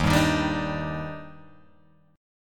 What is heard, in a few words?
Eb Major 7th, Guitar, Open G